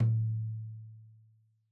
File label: Tom2 Stereo, Deeply Sampled Drums; SMDrum